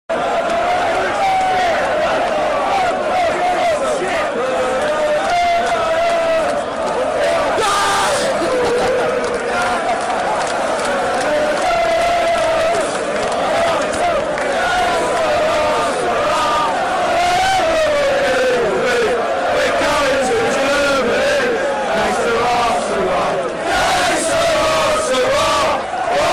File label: Crowd Chorus; Chelsea F.c.; Chelsea community